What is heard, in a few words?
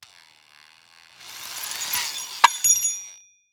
Industry Tool Drill Power Drill Drill Bit Fall Off When Turned On